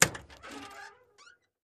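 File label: Cutlass Trunk Open Squeak; trunks & hoods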